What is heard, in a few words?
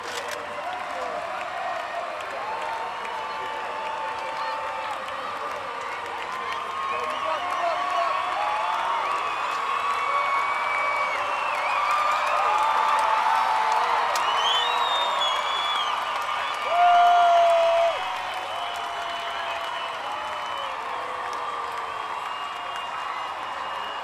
Crowd Large Cheer Arena Stadium Hockey Game Montreal Chant Applause Whistle; Montreal Canadiens Habs